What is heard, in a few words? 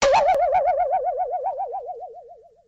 boing; Misc Percussion